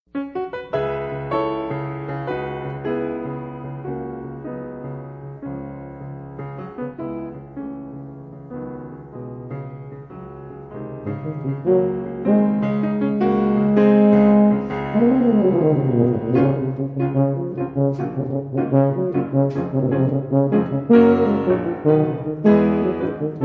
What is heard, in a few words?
Tuba Sonata; Sonata for Tuba and Piano